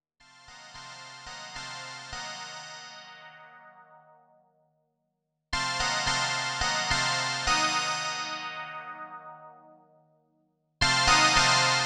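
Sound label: MKS-50 Chime Bell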